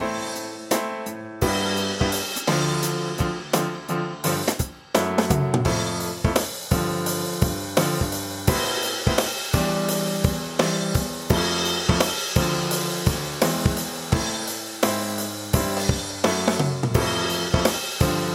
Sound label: piano